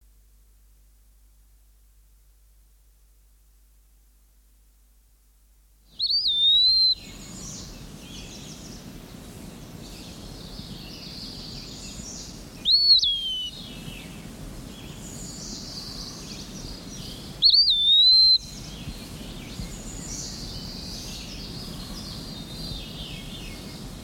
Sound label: eastern wood pewee, songbirds